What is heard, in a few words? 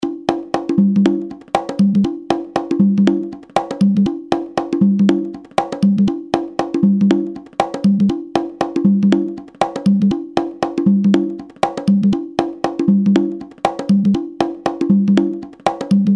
CONGAS